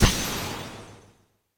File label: hit; Idle Wizard